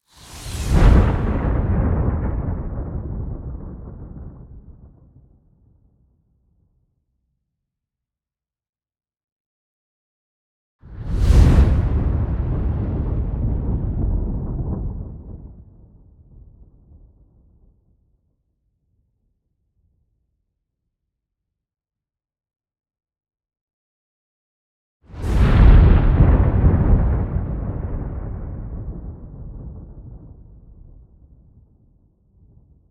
Thunder Blasts And Lightning With Swoosh And Reverse Blast